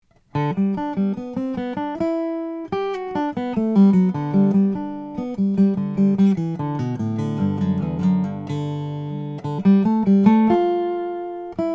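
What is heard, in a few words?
rainsong vintage, guitars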